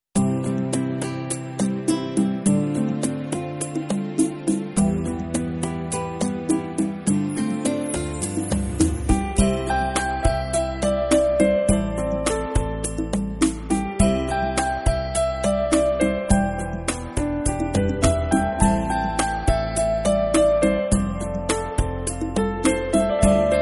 rumba

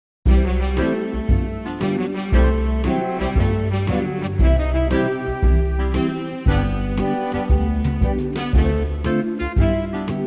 BLUES

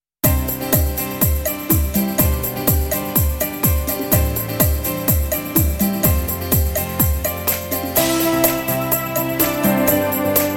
CHA CHA

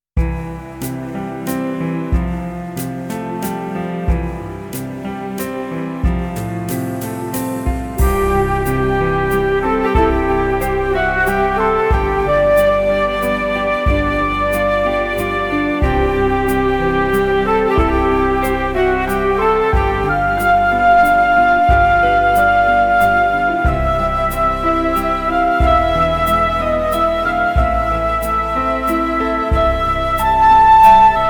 ben waltz

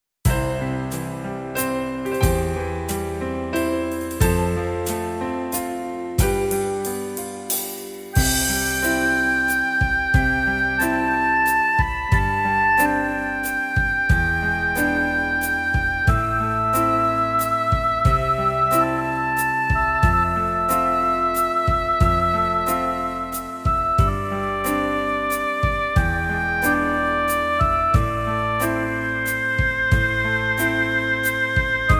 waltz

